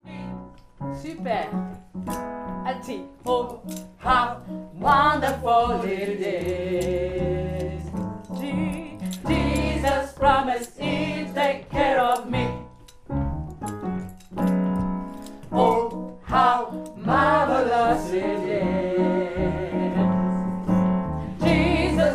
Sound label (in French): alto